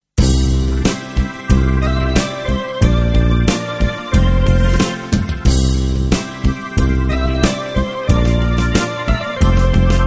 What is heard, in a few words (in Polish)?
ave instr